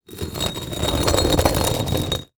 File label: rock sand scrapes concrete